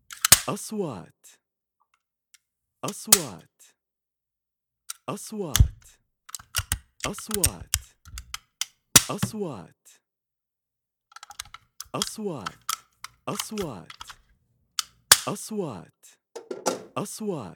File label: plumbing metal stapler